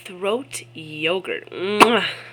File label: throat yogurt